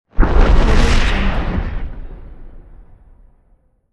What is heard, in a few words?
explosion